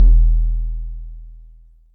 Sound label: kicks, Bass Drum